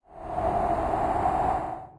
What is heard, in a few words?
wind; smite; kukulkan